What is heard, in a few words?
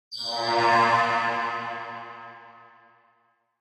Logo Electronic Whistle Drone with Reverb